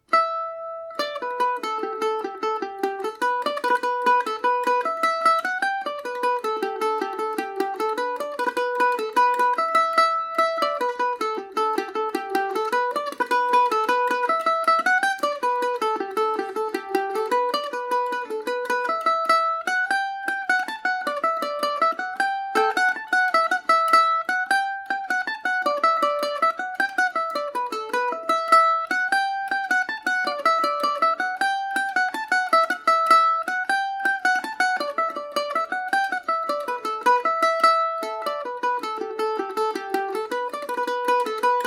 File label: glass of poitin gmaj emin, mandolin; Paris Swing Macaferri mandolin